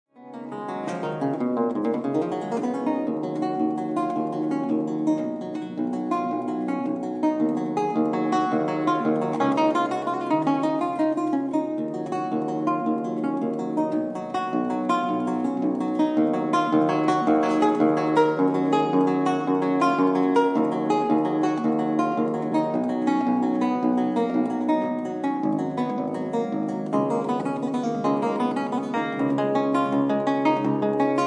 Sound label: carillon; Bellocq